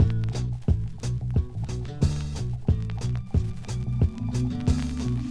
bass empty, Loops

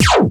brake; Zaps and Beeps

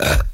burp short

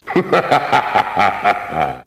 laugh devil; ooh ahh

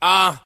ah; ooh ahh

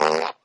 fart short

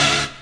rave, stabs

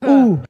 "ooh" male; ooh ahh

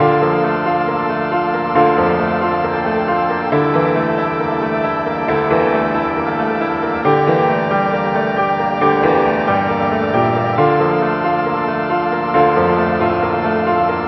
piano, Loops, Sad

